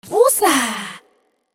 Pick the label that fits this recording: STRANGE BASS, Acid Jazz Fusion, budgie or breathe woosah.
breathe woosah